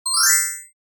キラリン 短

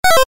ミス 3連 レトロ

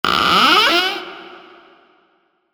扉を開ける リバーブ有り; モノラル; ＲＰＧゲームのダンジョンやフィールド

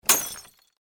ガラスが割れる音

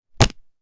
カードを出す音; board game casino mahjong

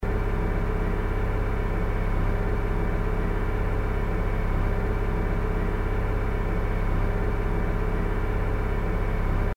車のエンジン音; 環境音

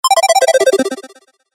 キャンセル 中音 レトロ モノラル

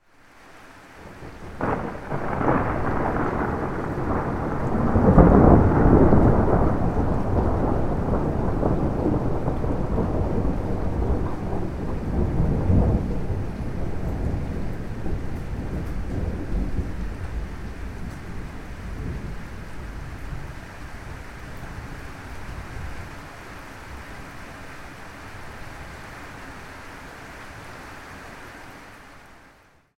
雷 その1; 環境音

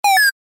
バビュン レトロ

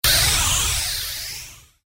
ビーム砲

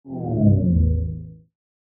能力低下

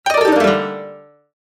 場面切り替え 琴 和風 下り

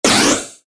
エレベーターの起動音 近未来; モノラル

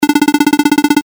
会話

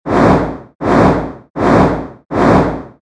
モンスターの羽ばたき 4回 速め

